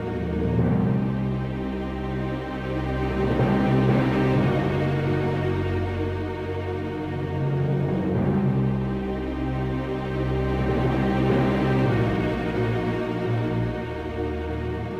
Fairy Conversation Tension Loop; Sacred Odyssey Rise of Ayden; Android

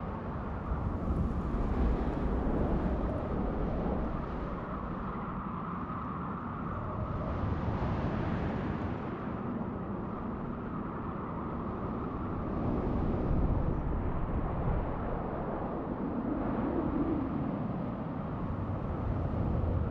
amb snow

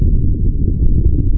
fire loop

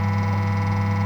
ELEVATOR Movement Loop Smooth loop mono

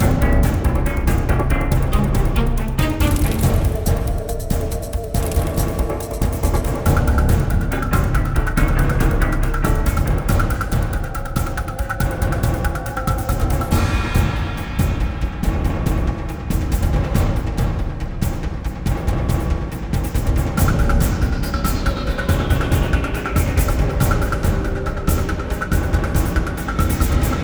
Action Boat

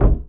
Football paddle hits wall

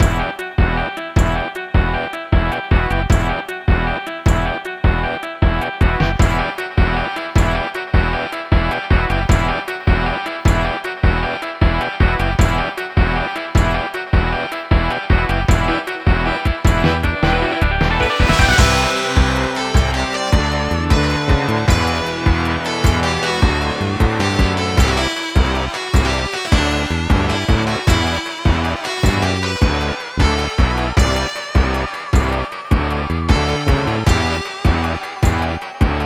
Bossfight (Loop); Beholgar

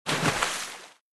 Swim Cannonball

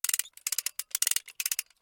Gear; Puddle

